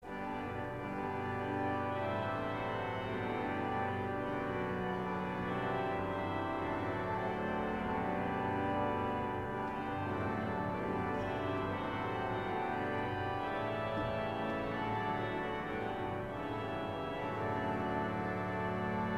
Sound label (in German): oboen Reihe, orgel